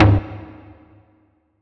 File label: Taiko Tom; Percs